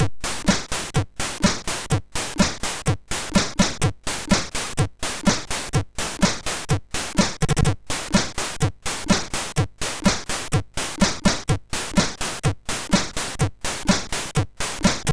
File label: Techno, MOS6581R2